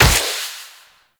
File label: Reaper Wall Run Step; Wraith